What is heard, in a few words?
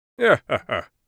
laugh, Mage Voice Pack